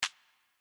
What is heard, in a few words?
button click